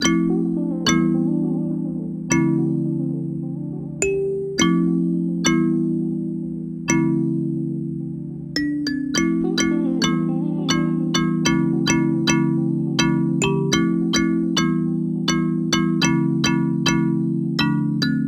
songstarter loop bikini Fmaj

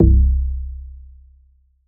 bass one shot c

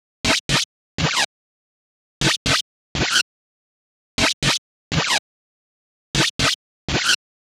Gbm Lead

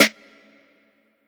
Snare Wire; Kryptic Samples

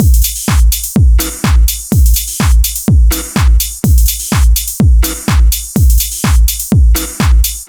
drum loop 125

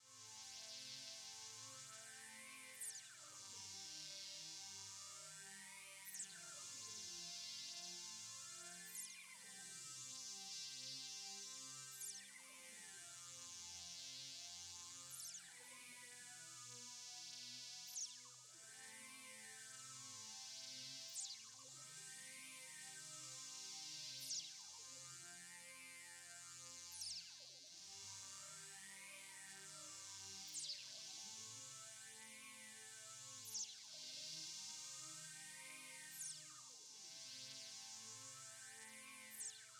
poly lfo

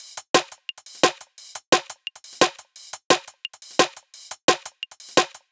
174 Drums Tops

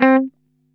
Guitar C3

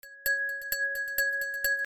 Perc Cricket

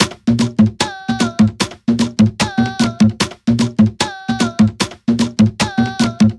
percussion loop macumba 150; TROPKILLAZ

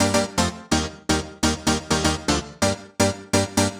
126 G House Lead Synth

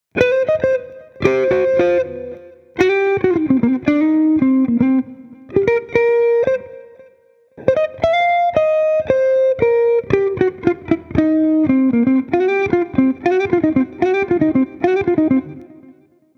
Guitar Solo With Effects 115 BPM

